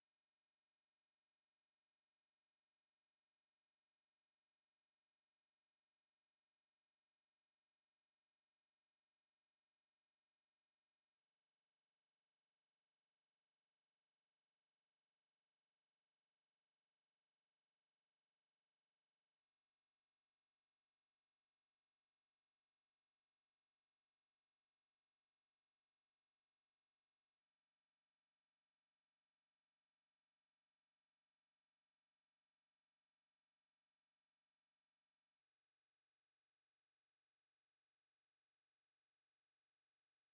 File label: non audible